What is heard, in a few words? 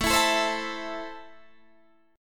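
A Minor, Guitar Standard, chord strum; Am strummed; 17,19,19,17,17,17; JGuitar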